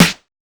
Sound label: Snare, drums